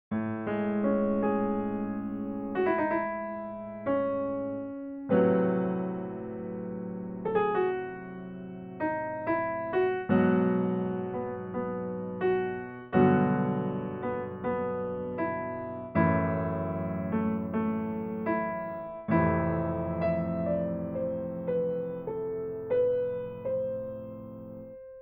piano lid close

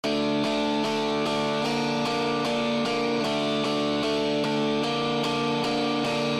guitar before

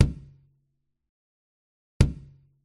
bassdrum

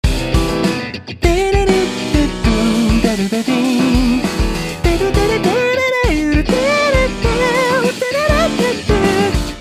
mute bass

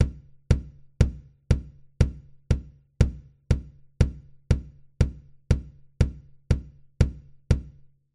one bass

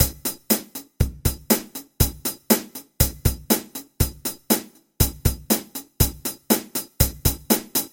drum

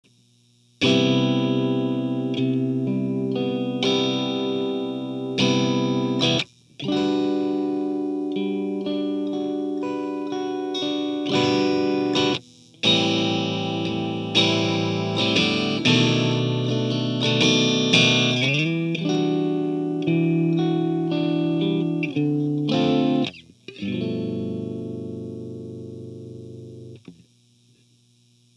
pattern amp close

